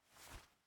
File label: Player Move Switch; MiSide